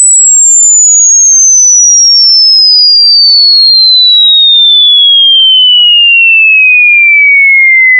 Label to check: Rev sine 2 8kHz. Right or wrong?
Right